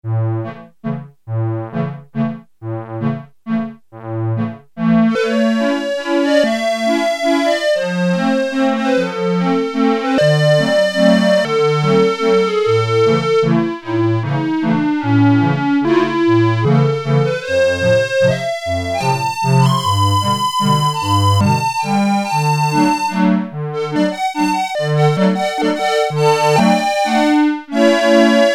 Harmonium; Sequential Circuits Prophet-5 (Ver.3); Factory Patch

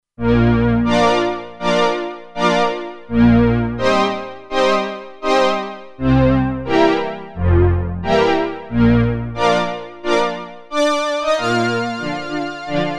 Orchestra, Roland Alpha Juno-2; Original Sound Chart patches

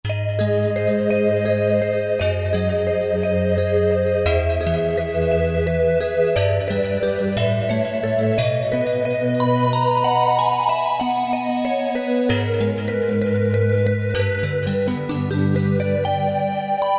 Tria Bells, Roland JD-990; Factory Patches

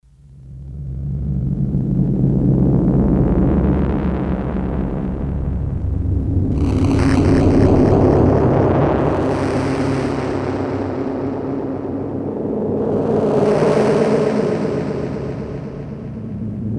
Rumble, Korg DS-8; Factory Patches

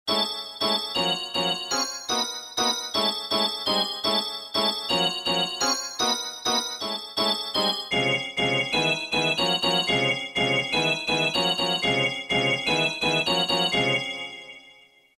Fly Chord; Roland M-DC1

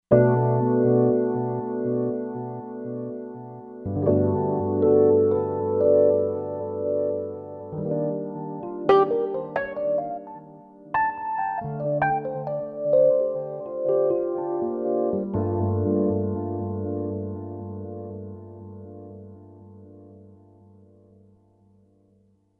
Tre EP, Roland Fantom XR

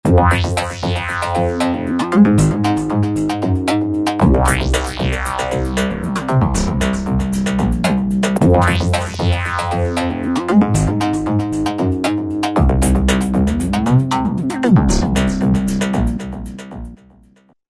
Roland V-Synth; Cyklotronik; Factory Patches

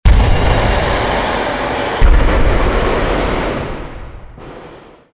V-Explosion, Roland V-Synth; Factory Patches

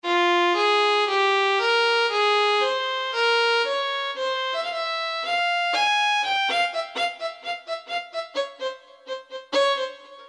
Solo Violin

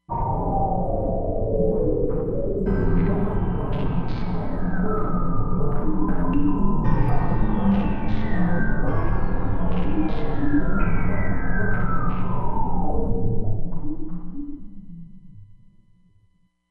Low Beat

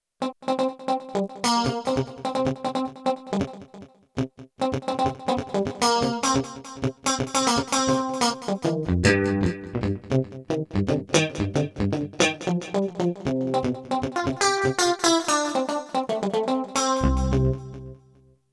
Funk Mute, Roland D-70; Factory Performances

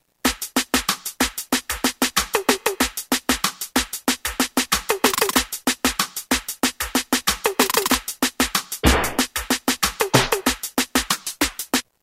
ragga 94, Roland M-DC1

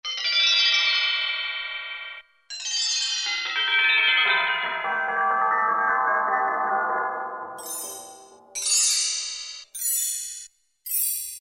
BELLTREE; Latin And F.X. Percussions; Roland SN-U110-02

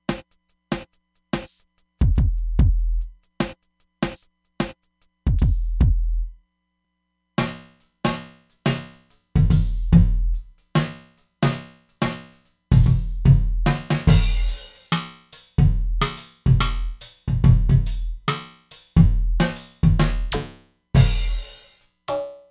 Metallic Tank, Roland DEP-5; Factory Patches

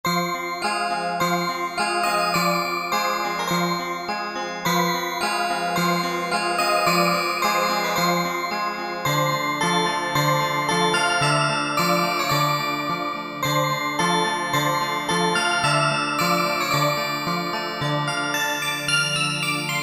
Celesteal, Alesis Z3; Sanctuary

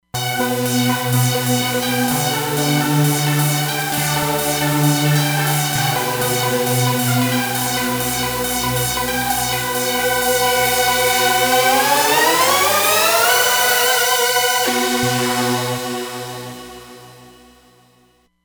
Dely Reso Saws, Roland Fantom XR

